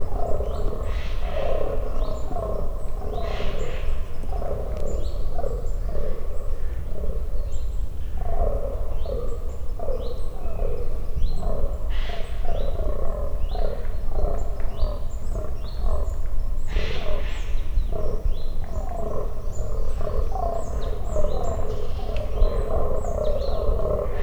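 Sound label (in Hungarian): bőgnak madarak, dámszarvas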